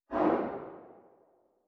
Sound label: gun far